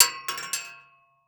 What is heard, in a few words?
Weapon Bullet Shell 44 Dropping On Metal; AdobeCC